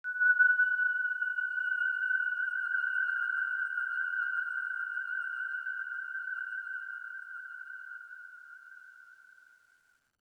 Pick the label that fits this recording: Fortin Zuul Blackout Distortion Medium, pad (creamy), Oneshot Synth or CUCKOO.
Oneshot Synth